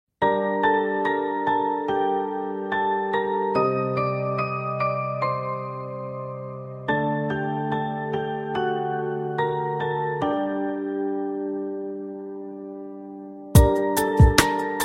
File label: piano haunted 3d; ringtone